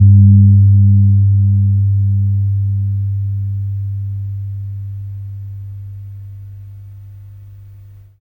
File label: KEMPUR, KEMPUR GONG; Spectrasonics; Heart of Asia